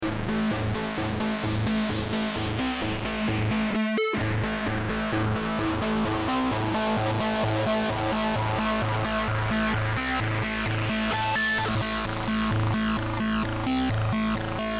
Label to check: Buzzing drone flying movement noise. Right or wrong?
Wrong